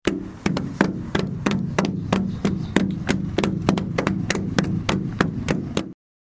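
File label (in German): hände klopfen an die scheibe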